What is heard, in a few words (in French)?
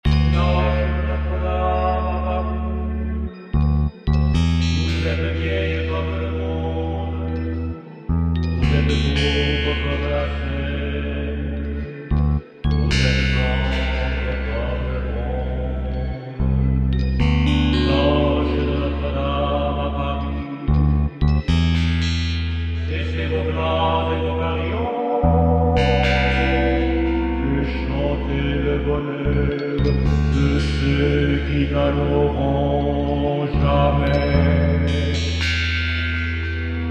voix; Les cloches de notre dame